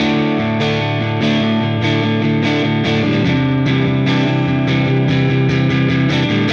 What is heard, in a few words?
Silos Dirty Strum Guitar, Indie Rock